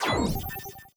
print complete

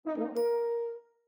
Windows Print complete

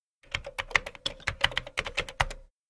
Typing

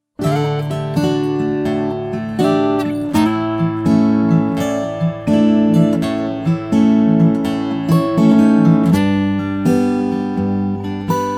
music street

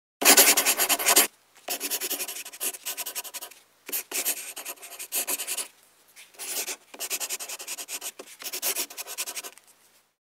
Pencil Writing

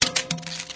Empty Recycle

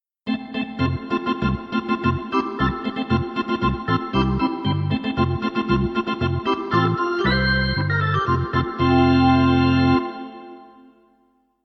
Arena Organ

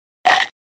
EAT OR MUNCH

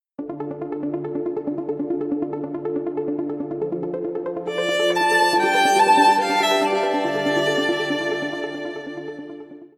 Dubstep Violin

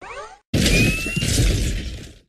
Repulsor Blasts; Iron Man

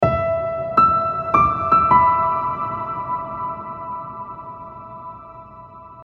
DreamGrand

